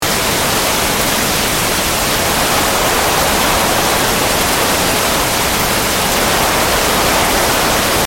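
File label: Ambience Rain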